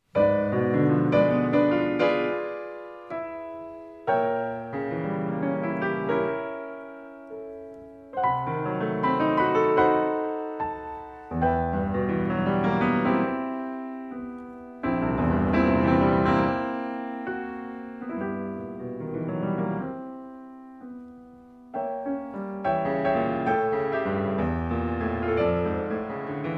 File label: piano